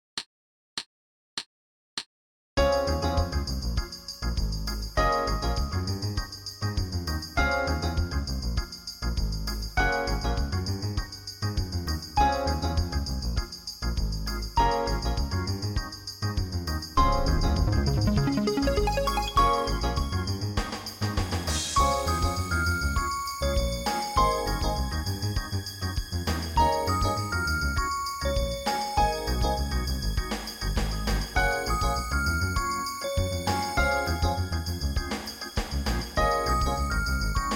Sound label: D-flat Concert Whole Notes